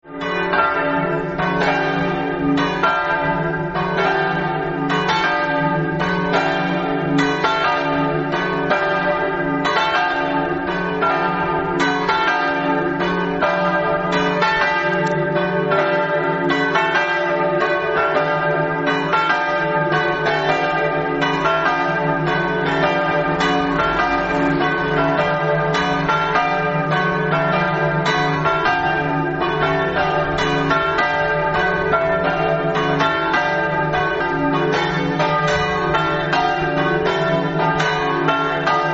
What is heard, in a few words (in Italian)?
campane; sclaunicco